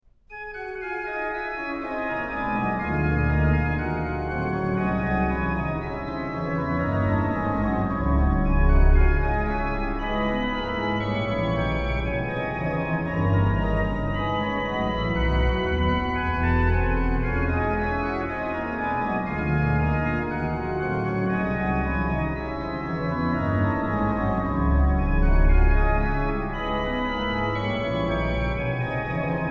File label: organ; BWV0637; Durch Adams Fall ist ganz verderbt; BACH; BACH ORGAN WORKS; CHORALE PRELUDES